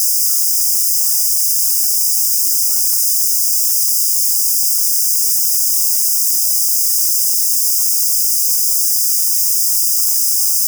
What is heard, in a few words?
noisy sig